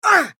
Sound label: Scream